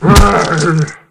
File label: claw strike, zombie